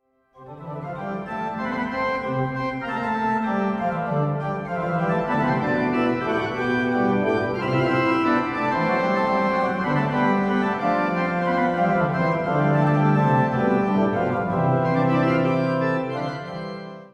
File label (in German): Fuga Suite C Dur, Orgel; Gottlieb Muffat; orgelweihe und orgelkonzerte in der malteserkirche